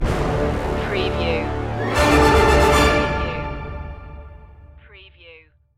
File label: stinger no choir